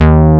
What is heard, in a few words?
MOOG D#1, Moog Synths; E-MU Formula 4000 Series Vol. 3; Analog Odyssey